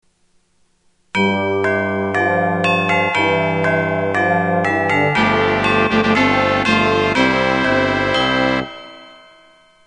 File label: opening tubel brass